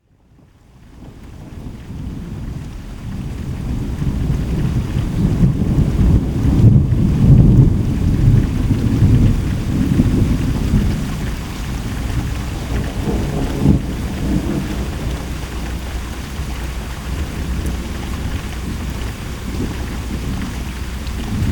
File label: Thunder Recording